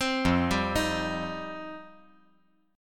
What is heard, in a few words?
F 7th Suspended 2nd F down